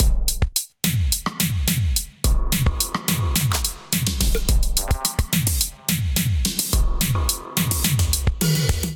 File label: Feel Right Kit Melodic 107 bpm, Drums; MIDI Clips; Drive and Glow; Ableton; Factory Packs